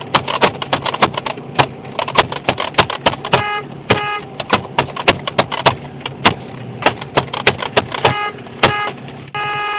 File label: Car Horn And Junk